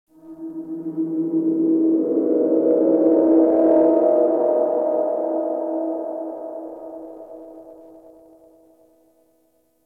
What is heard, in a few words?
Space Exterior Dist Call, Ambience, Space Battles; Warframe